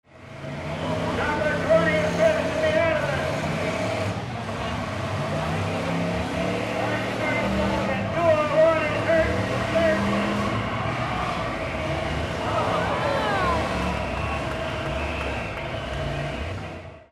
CARS

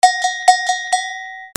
BELLS

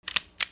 WEAPONS

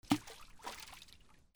WATER

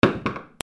FALLING

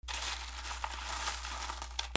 MONEY

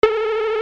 BUZZERS

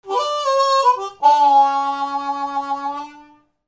MUSICAL

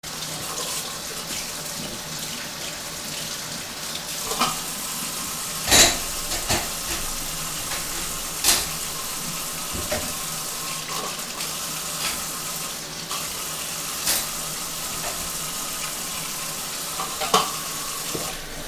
KITCHEN

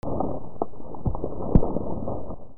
RHYTHMIC

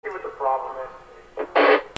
PHONES

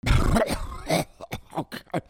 PEOPLE

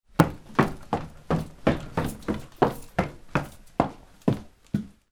FOOTFALL

